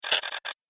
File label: Sci Fi Factory; Production Element 1